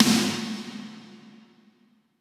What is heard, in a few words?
Impacts, Effects